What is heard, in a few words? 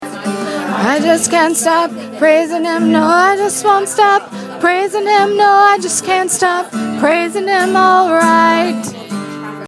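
soprano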